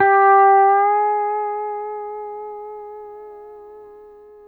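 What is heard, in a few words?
pedal steel bend up G4, Right; PEDSTL BENDS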